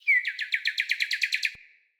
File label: Cardinal Female Bird Song